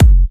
Kicks; Vengeance Samples

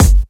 Soft Kicks; Vengeance Samples

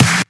Snares; Vengeance Samples; VEH2

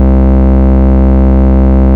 Ultimate Bass Root C; Vengeance Samples

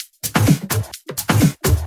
Houseloops 128BPM; Vengeance Samples

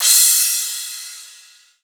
Crash, Cymbals; Vengeance Samples

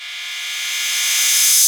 Reverse Cymbal; Cymbals; Vengeance Samples

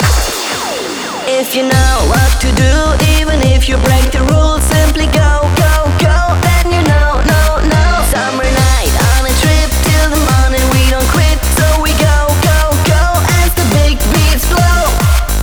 Pitched If You Know Mix, 140 BPM; Vocal-Hook Kits

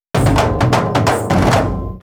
Fill 128 BPM; Vengeance Samples; VEH1 Loops

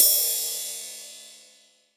Ride, Cymbals; Vengeance Samples

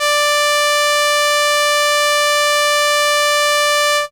Viola D5, ARP Solina Unmodulated; musicradar-synth-samples

